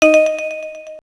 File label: Zoom In, Camera; Motorola V9m